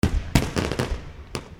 FIREWORKS; pat & mat game

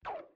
DROP OBJECT OVER; pat & mat game

